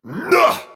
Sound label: Grunt Attack; Paladins; Lazarus